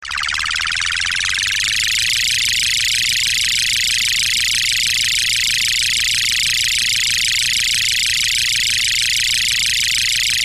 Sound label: High Frequency